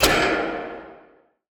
Swoop; Antidote Advent

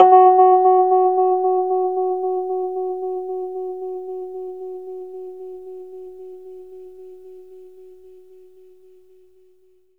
Rhodes fast F#; 3D Sound; 3D Keys VOL-1

